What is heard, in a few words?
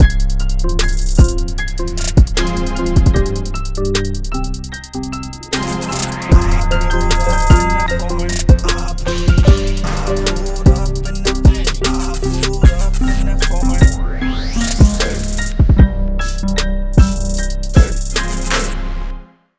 Trap